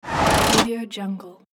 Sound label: hand brake